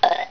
Burp

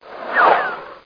Skate

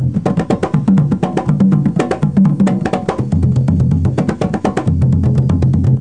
Sound kendang sound; GAMRAN; Epson

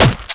stab impact; SpecOps2 MPlayer Demo

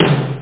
tomtom; Aminet 21; GTI - Schatztruhe; Amiga 8-bit Sampled Voice; 8,363 sample rate

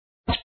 shoot sound; magic heaven

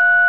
TONE; webphone

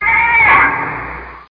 Whale; Mosaic Magic

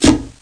thud plop; InventorLabs - Transportation; roadrace

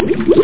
water; beach

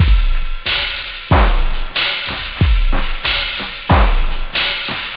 hiphop2, house; OctaMED SoundStudio V1

